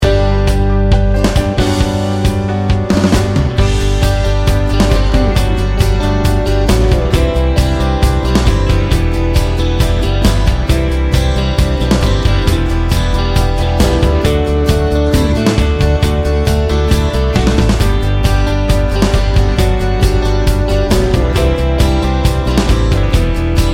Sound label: open road